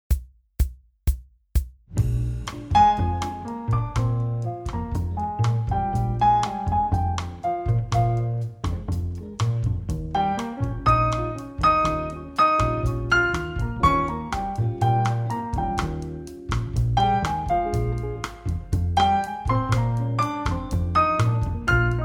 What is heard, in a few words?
piano I and IV bossa; transcribing solos